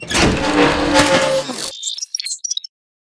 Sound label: escape, ships